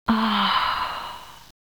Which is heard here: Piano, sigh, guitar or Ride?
sigh